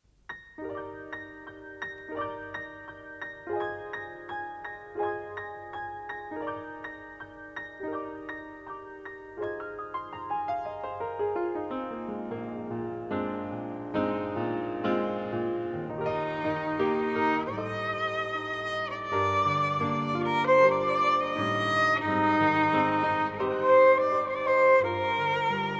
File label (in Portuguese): piano e violino